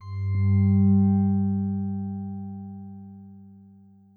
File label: circle appear